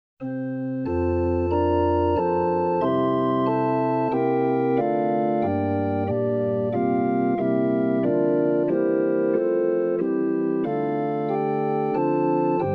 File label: Organ